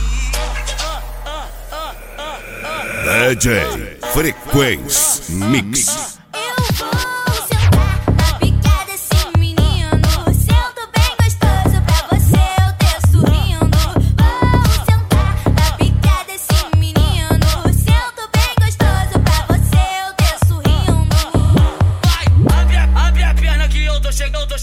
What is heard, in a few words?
sound car